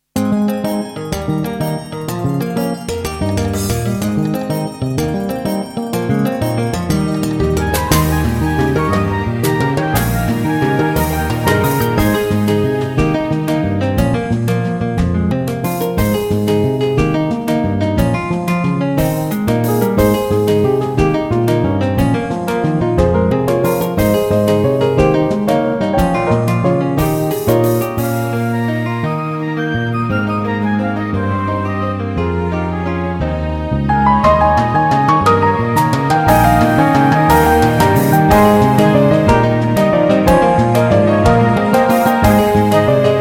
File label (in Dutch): fusion, Lentesfeer met Pasen, ontspannen en opwekkend; AG fusion